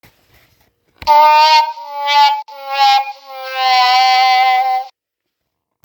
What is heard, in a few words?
TROMB